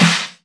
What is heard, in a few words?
Snare; Vengeance Samples